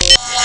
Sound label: break drawer; Amiga Soundtracker Sample Packs